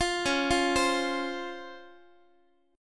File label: C sharp Major 7th, Guitar; C#M7; arpeggiated